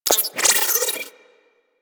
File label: Guild Computer Beeps; Ambience; Warframe